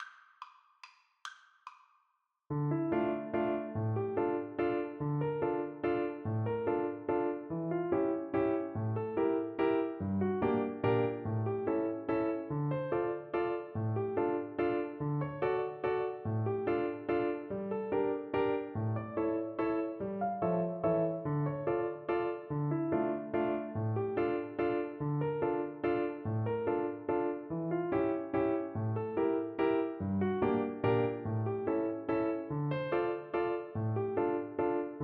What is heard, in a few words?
swallows waltz, Flute